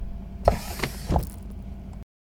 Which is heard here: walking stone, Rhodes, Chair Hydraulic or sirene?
Chair Hydraulic